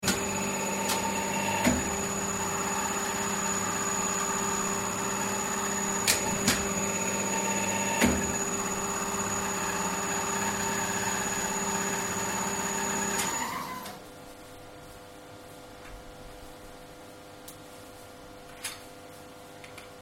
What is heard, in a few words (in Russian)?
zvuk pressa na zavode